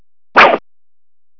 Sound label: Bow Arrow; Gravis Xperience